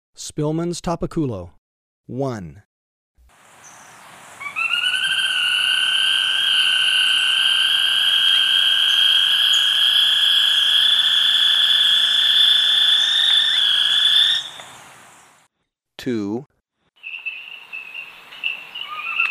Spillmann's Tapaculo, Birds of Ecuador; S. America

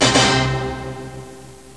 DUHDUH, SYNTH

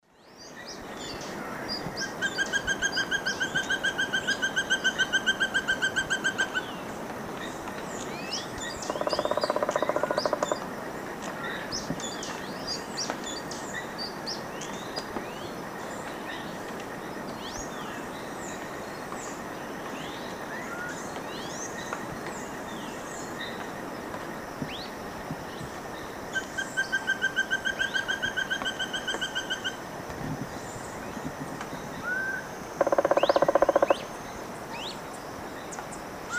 Chrysoptilus punctigula, calls and drum; S. America; Eastern Lowlands

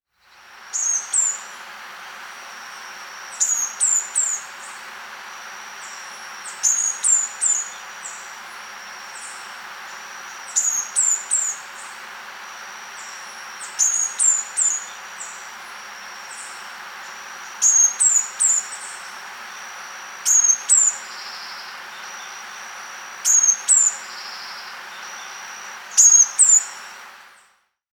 Call, Birds of the Costa Rican Cloud Forest; Natures Songs

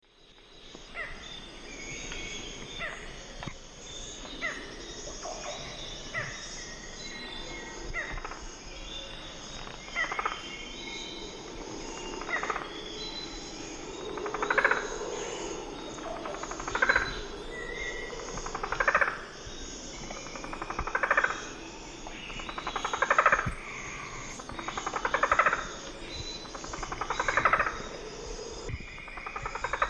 Micrastur ruficollis, song; S. America; Eastern Lowlands

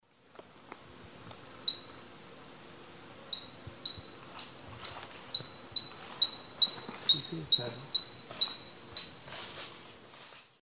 Myiornis ecaudatus, song or calls; S. America; Eastern Lowlands

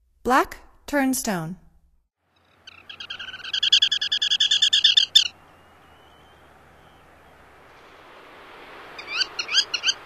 Black Turnstone, Songs of Birds of North America; Stokes

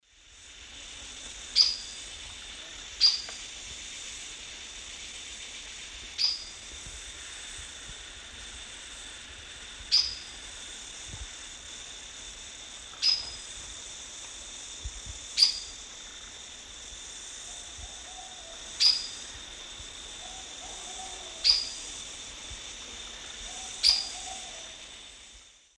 Sclerurus caudacutus, call; S. America; Eastern Lowlands

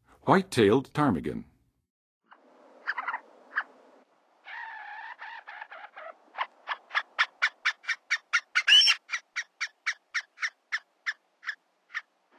White-tailed Ptarmigan, Birds of North America; Western USA; Western USA Songbirds

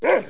DOG, animal

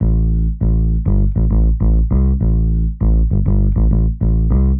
X Bass, Rock Bass

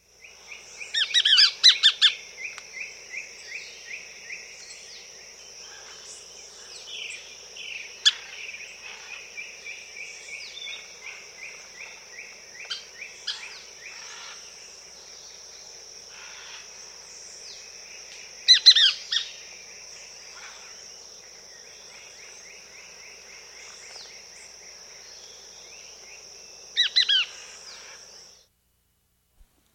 Gray-Capped Flycatcher; S. America

